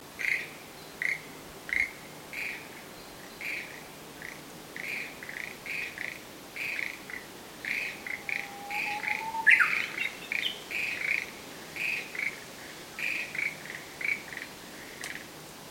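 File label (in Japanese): shuregeru, Schlegel's green tree frog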